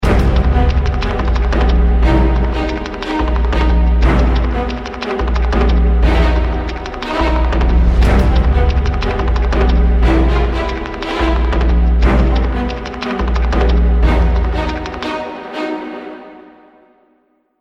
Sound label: start round, music